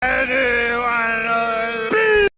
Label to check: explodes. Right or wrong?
Right